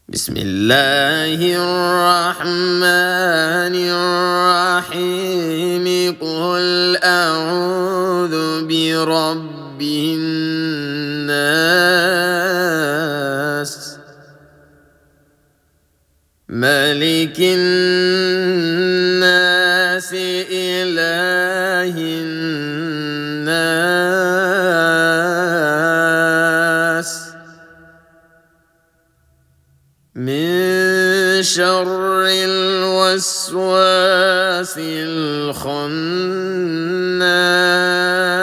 Chapter 114, Al-Nas (Mujawwad) - Recitation of the Holy Qur'an